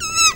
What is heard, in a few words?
VIO MISC, MISC VIOLINS